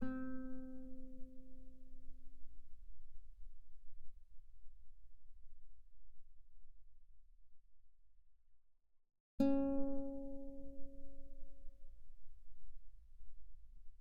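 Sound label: Guitar pianissimo sul B C4 B4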